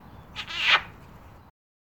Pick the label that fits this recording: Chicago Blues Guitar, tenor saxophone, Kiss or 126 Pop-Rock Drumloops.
Kiss